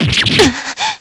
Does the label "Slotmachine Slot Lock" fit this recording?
No